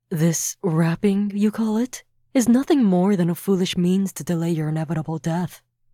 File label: Death; Junko; Phantastical Boundaries